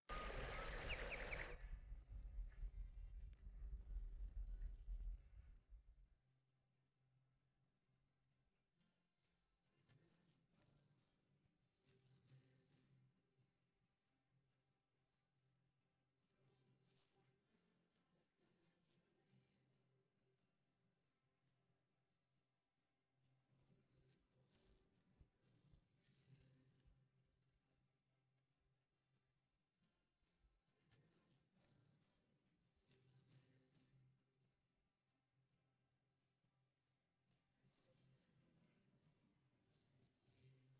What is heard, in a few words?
drums; Stems; AI MUSIQUE